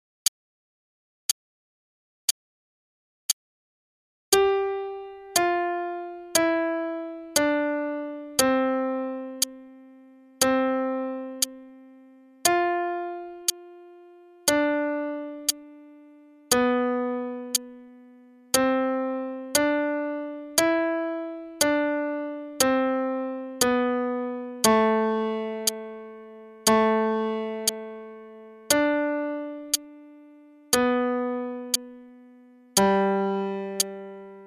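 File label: Starlit Melody, piano; Faber Adult All-in-One Book 1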